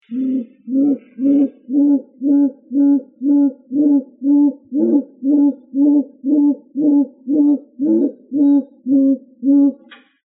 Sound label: nervous